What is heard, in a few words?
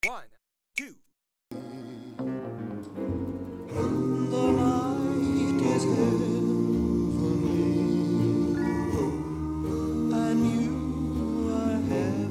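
Singer Rehearsal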